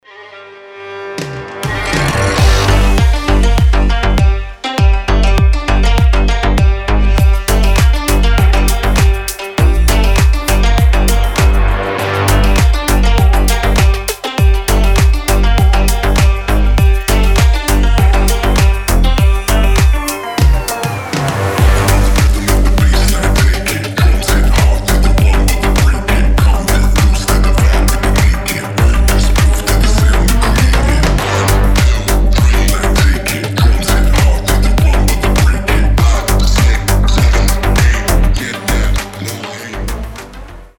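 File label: basovij ritm, bass rhythm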